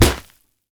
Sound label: punch grit wet impact